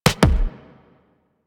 Weapon Punch Double

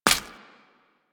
Step Default, footsteps

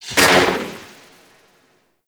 Microwave Pistol Tail; Grineer

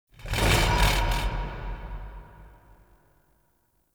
Ceramic Rumble; Ambience

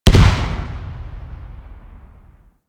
Space Fighter Rager Elite Missile Explosion; Warframe; DE; Vehicles; RailJack; RailJackEnemyShips; Grineer

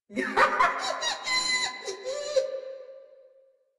Laugh, Grineer Queens Guards; Warframe

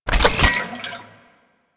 Oil Grenade Create; Warframe; Vehicles; NechroMech; Abilities

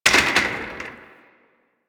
Office Chair Impact

